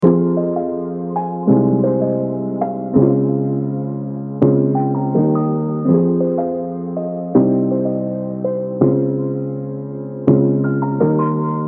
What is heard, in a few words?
F min 165 BPM Keys